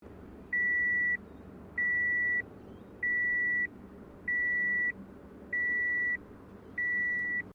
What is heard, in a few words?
CAR TOYOTA COROLLA IGNITION KEY WARNING, hatchback; 2009 Toyota Corolla hatchback; Cars (Sound Effects:Vehicles)